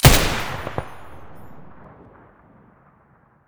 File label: muzzle m249 stereo, weapons